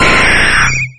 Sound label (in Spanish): Grito de Pidgeot